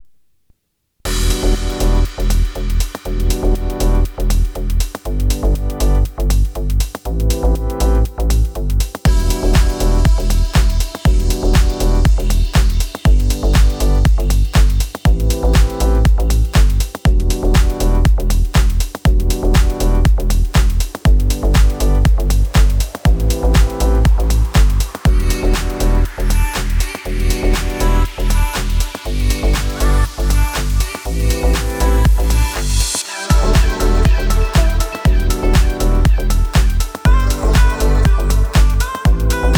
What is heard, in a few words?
deephouse; by burak sound bank; deep house vol 1